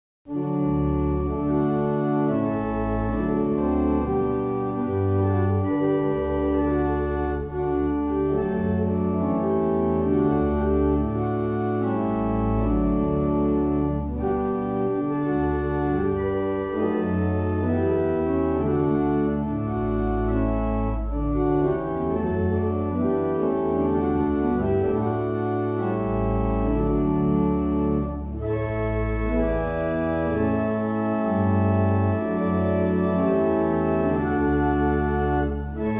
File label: Easter Hymn, barrel organs; Meldreth